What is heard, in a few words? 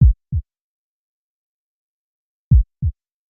Sting Heartbeat